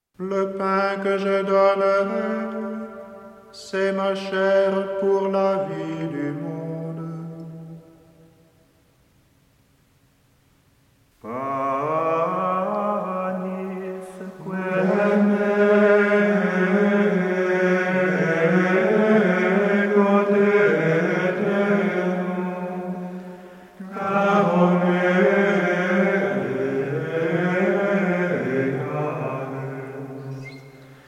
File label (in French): Communion Panis, canti